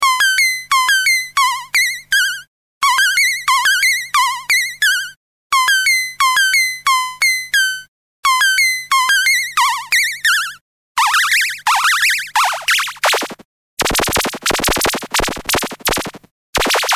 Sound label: Solus FM+LFO